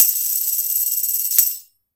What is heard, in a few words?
DEFI ROLL, Mediteranean Traditions percs